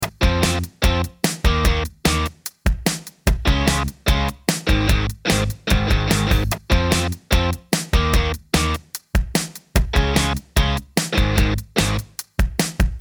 Indie Rock Loop

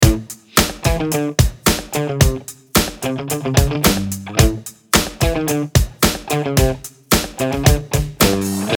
Funky Pop Loop

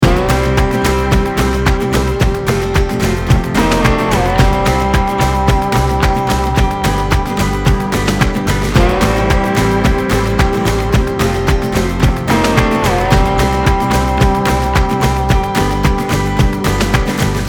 Country Loop

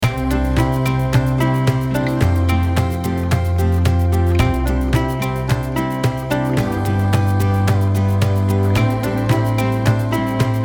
Folk Loop

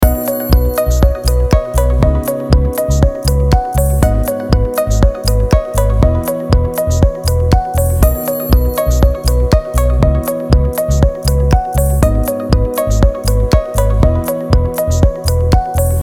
Inspiration Minimal Corp Loop

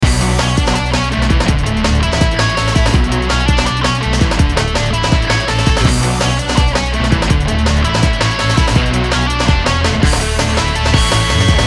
Space DnB Loop

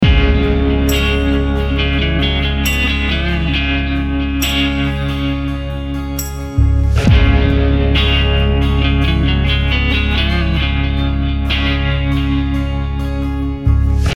Tumbleweed Loop

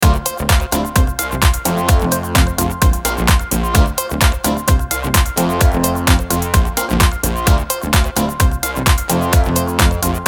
Corp Dance Loop

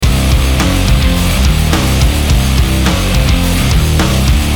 Nu Metal Loop

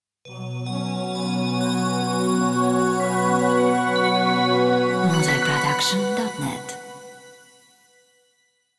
Corporate Logo

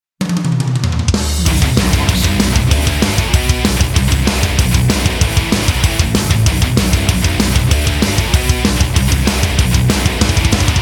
Monster Bells

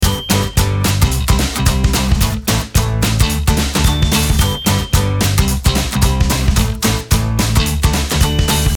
Fun Upbeat Loop

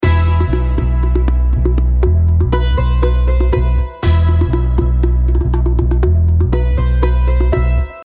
Turkish Beat Loop

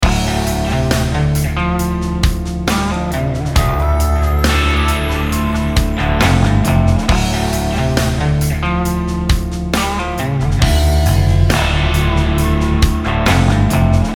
Texas Police Loop

